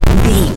retro explosion bass

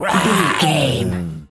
tas devil cartoon

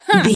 voice female effort jump action

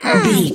voice female effort quick action

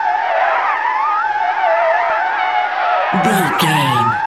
tyre skid loop

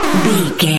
retro teleport warp effect

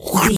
lizard tongue lick

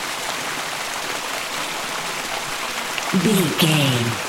river stream flowing water loop short version

